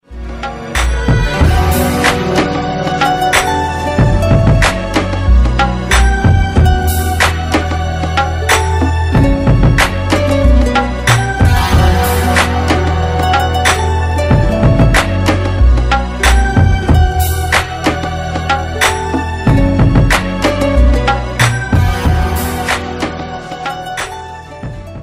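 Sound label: instrumental slow down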